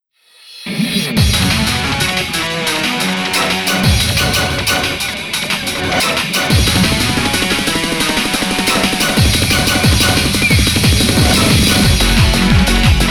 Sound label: ride; DanceDanceRevolution Classroom Edition